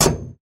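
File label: sounds of scratches on metal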